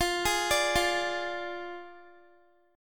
F Minor 6th F down Guitar; arpeggiated; tuning 4E,4A,5D,5G,5C,6F

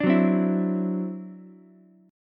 E 7th Suspended 2nd Sharp 5th, E, down, Ukulele; E7sus2#5; strummed

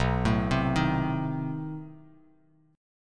B 7th Flat 9th B down, Bass Standard; B7b9 arpeggiated; chord shape; JGuitar

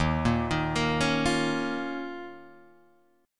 Eb 7th Suspended 2nd Eb, Guitar Drop D

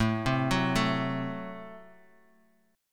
A Minor 6th A Guitar Half Step Down

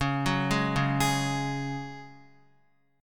Db Suspended 4th Db, Guitar; arpeggiated

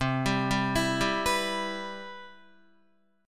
C Major 7th, Guitar; CM7 arpeggiated; JGuitar

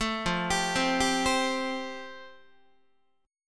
F Major Add 9th, Guitar; Fadd9 arpeggiated; JGuitar

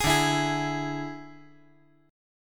D Suspended 2nd Flat 5th D

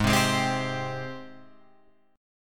Ab Major 7th Ab down Guitar Open F; AbM7 strummed

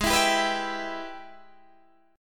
Ab Minor Major 7th Sharp 5th, Guitar, Open D; 18,19,17,17,19,17; down

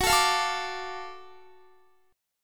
F sharp Minor Major 7th Sharp 5th, Guitar; F#mM7#5 strummed; JGuitar

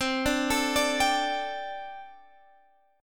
D Suspended 4th C, guitar; Dsus/C; arpeggiated

